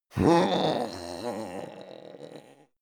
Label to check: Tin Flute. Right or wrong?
Wrong